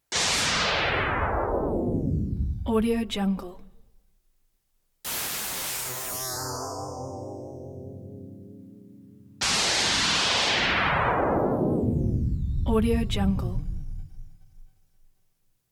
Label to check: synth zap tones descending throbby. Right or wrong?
Right